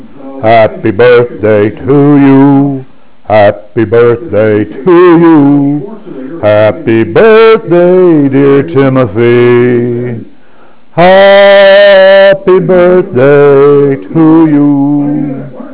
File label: birthday singing